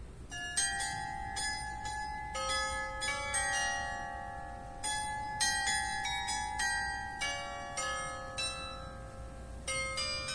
church bells; Rotterdam Field Recordings

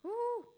soft cheer

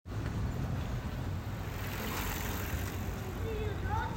City bike, Rotterdam Field Recordings

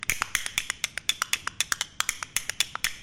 Clappy Thingy

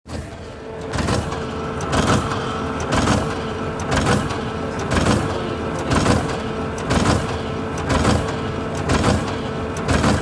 Risoprinter; Blaak

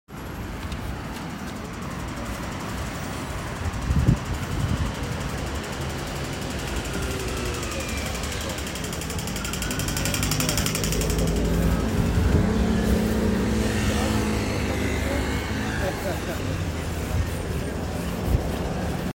Traffic light sound, Rotterdam; Rotterdam Field Recordings

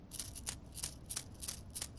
Bead Rattle

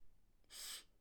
dry sniff

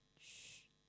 short shhh

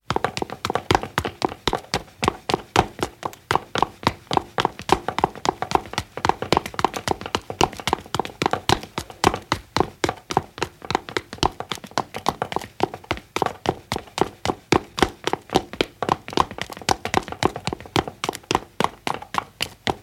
footsteps

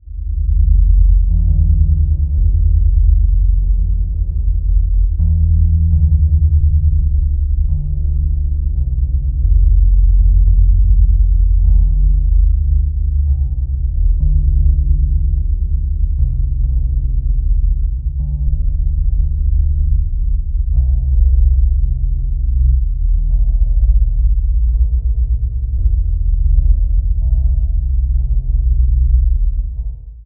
stretched roots

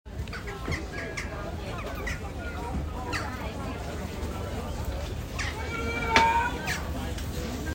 City Birds, Rotterdam Field Recordings

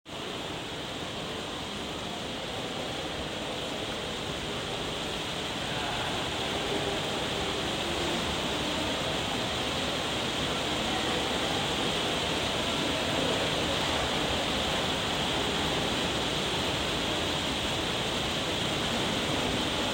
Rain; Rotterdam Field Recordings; wdka

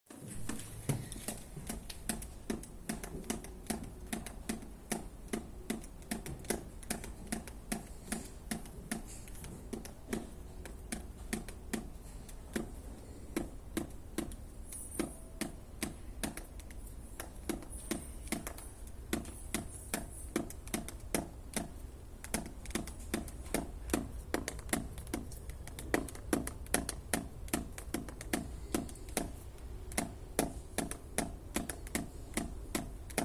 raindrops on plastic; Rotterdam Field Recordings

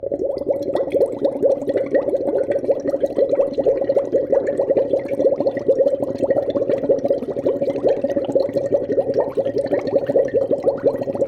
Water vase

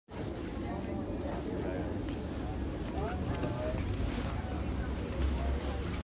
Jingle market, Rotterdam; Rotterdam Field Recordings

